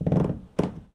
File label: Creak